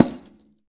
Tamorra, Roland BK-5 Percussion

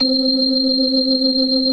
BLOOD OCT, Synth and Organ

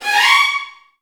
VN FX, Virtuoso Strings

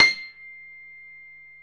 PIANO, Dance Mega Synth; Best Service

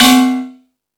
Thundadome, E-MU Pro-Cussion

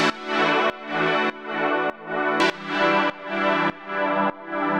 Pad alesis 100 E, sidechained

